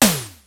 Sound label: Yamaha RX-5, drums perc